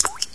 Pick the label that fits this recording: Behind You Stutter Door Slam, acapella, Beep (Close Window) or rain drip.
rain drip